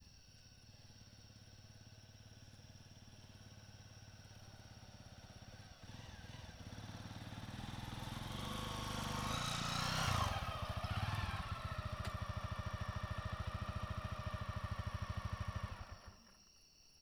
Moto vem de longe e estaciona bem perto e grilos; estacionando; colecao sonora do cerrado

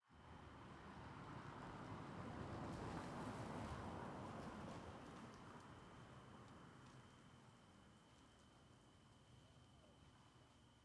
Ambiencia Anoitecendo na Comunidade do Moinho com Grilos e Mulher Cantando; Ambiencia Anoitecendo na Comunidade do Moinho com Grilos e Mulher Cantando longe

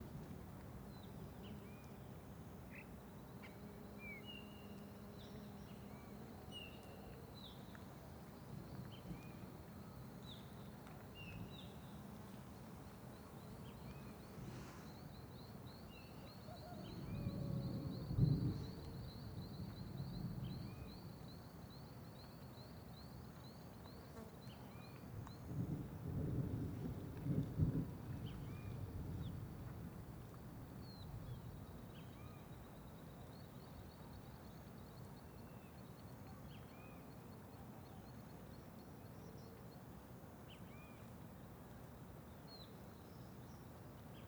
Mosca e trovoes; Cerrado; insetos; colecao sonora do cerrado

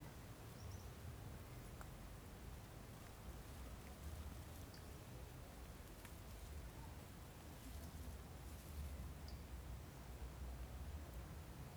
Ambiencia mata em fim de tarde com grama e pessoas longe; ambiencia rural

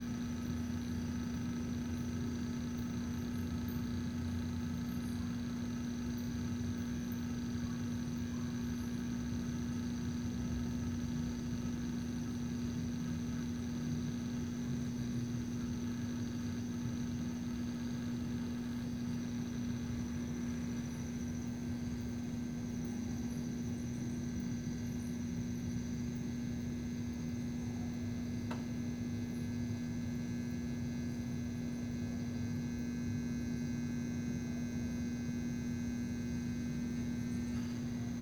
Transformador eletrico ruidoso a noite, ruido muda um pouco no meio; morcegos e gril; Cerrado

